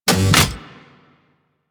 Gas City Claw Lift End; Ambience; Props; Warframe; Corpus Gas City; Props Remaster

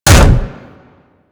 Contact Hit Effect; Vehicles; Archwing

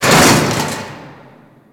Intermediate Coring Metal Bin Stop B; Warframe; Ambience; Grineer; Grn Intermediate Coring

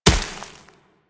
Snow Ball Impact; Warframe

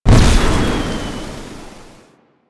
Flamethrower Fire; Warframe; Weapons; Lunar NY Ignis Skin

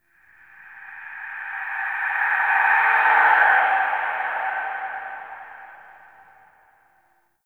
Shriek, Ambience; Champion Crypt

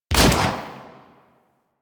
Redux Fire Shot, Weapons; Lato Vandal

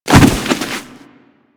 Bodyfall; Warframe; Kubrow; Bodyfalls

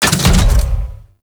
Mech Crounch Start; Nechro Mech; Vehicles

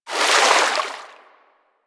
Hoverboard Terrain Caster Fluid; Warframe; DE; Vehicles; Venus

